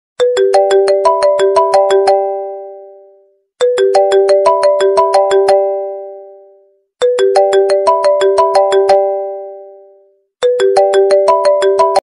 Techno

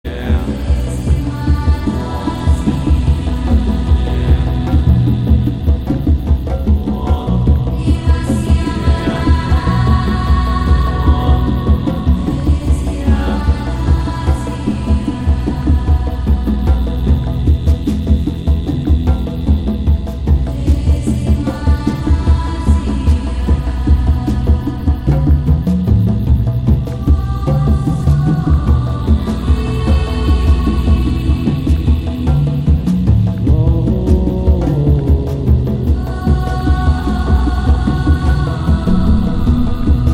drum beat ringtone; mohenjo daro 2016

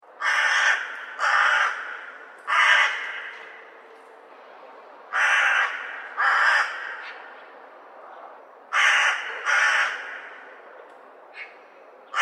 crow calls

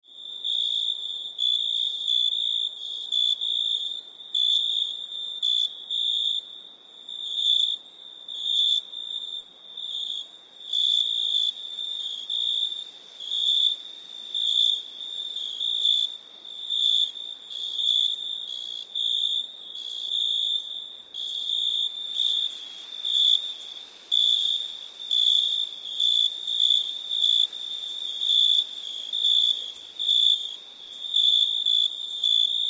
cricket noises

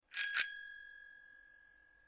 bike bell sound

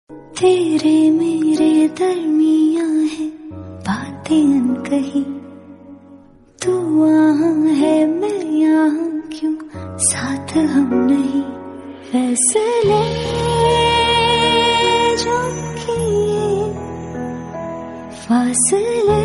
female version

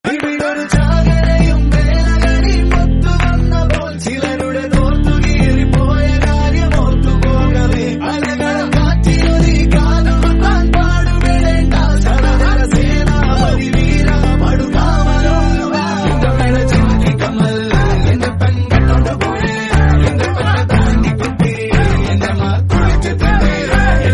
Malayalam Movie Song

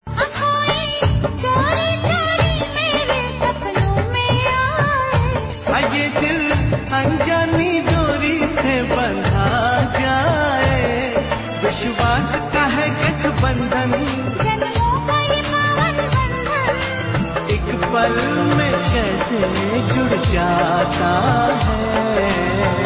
indian drama theme song ringtone; yeh rishta kya kehlata hai

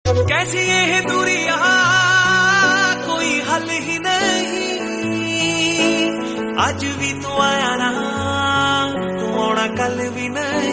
Punjabi

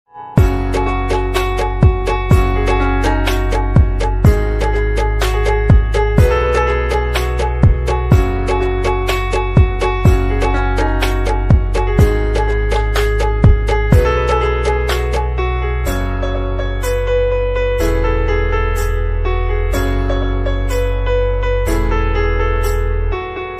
Instrumental

